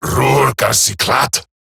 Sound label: Surprised Male; en; Dialog; Barks; Battle; Grineer; Eximus